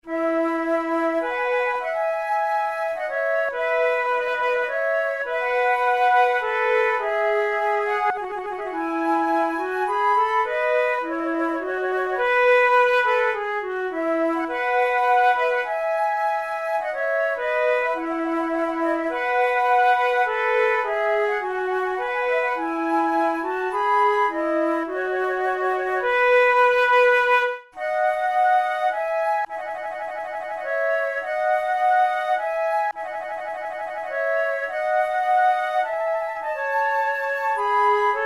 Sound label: two flutes menuets; Monteclair; 6 concerts; flute tunes